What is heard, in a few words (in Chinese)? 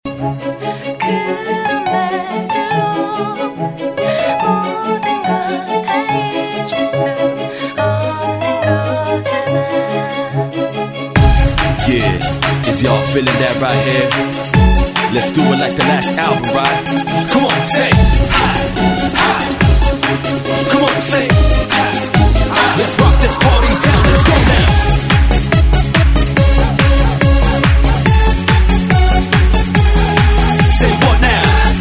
欢快 劲爆节奏